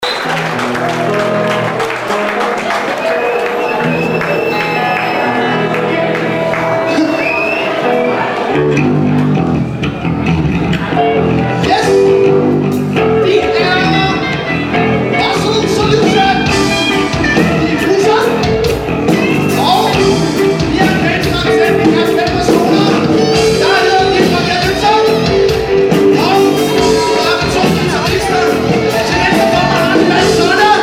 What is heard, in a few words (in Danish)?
sang